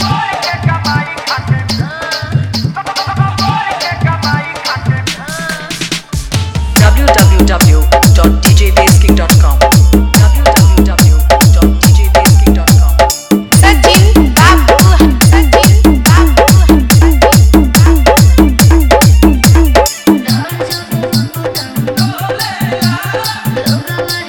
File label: Hard Vibration Mix